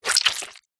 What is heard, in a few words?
gore guts bloody squeeze